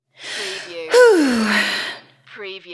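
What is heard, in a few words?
Female Expressive Sigh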